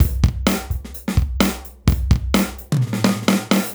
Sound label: Housebeats, 128BPM; Vengeance Samples; VEH2 Loops